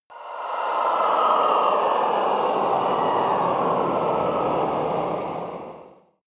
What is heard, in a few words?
evil choir cluster down